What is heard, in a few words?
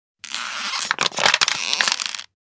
Plastic materials movement